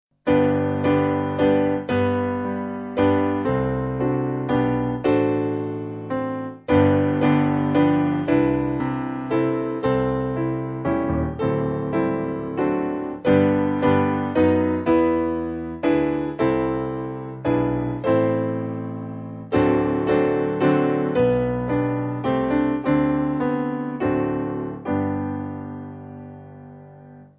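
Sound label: Piano, Free Music Backing Files for Churches - Piano, Band, Pipe Organ; Pentecost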